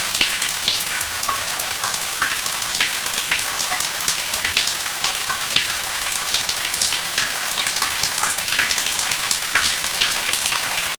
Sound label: ambience rain